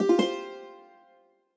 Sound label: system notifications